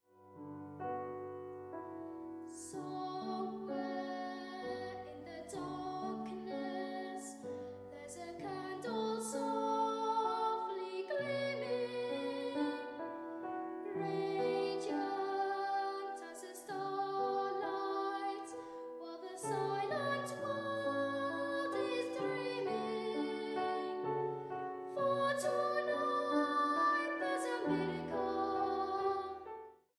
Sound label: Childrens Choirs; Christmas With Kinder